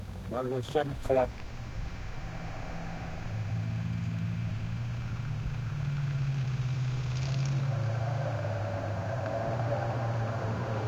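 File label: an off road vehicle driving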